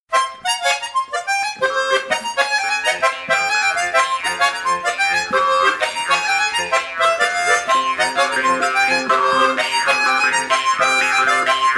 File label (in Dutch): Mondharp Hohner Chrominica in C; Muineira de Coia; Staalkaart